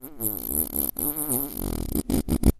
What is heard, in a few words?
string cheese fart